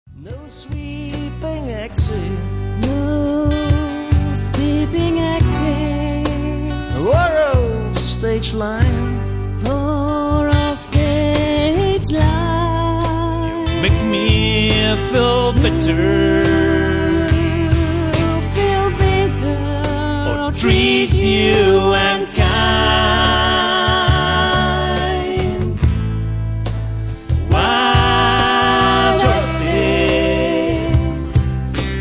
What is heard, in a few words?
Cello